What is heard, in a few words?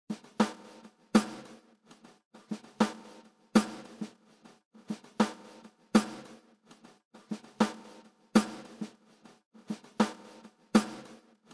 Wave Drum SEP SD; Decomp Mag Mix Phase TR